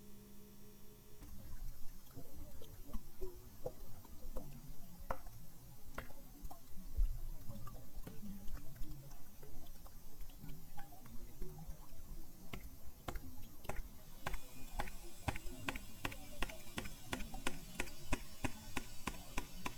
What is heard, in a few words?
stretch x8, Physeter; JASON 600kHz; UTLN CNRS; click identifications